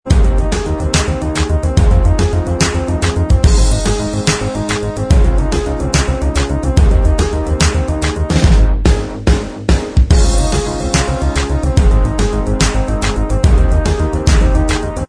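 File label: instrumental track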